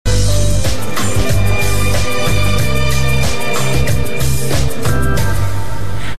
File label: Jingle; Autoroute Info